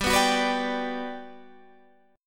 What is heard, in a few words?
G Major 7th, G, down